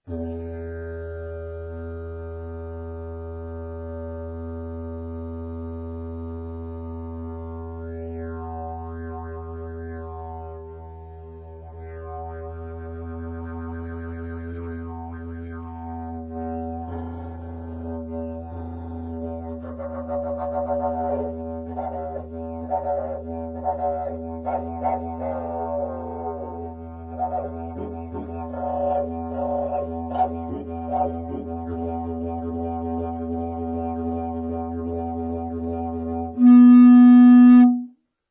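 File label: pvc E, didge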